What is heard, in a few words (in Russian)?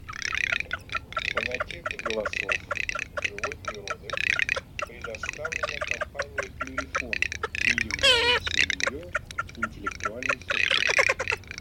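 Чирок-трескунок Marzaiola, манок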